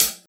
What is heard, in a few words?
Hi Hat Closed